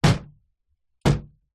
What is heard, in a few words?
household window old wooden close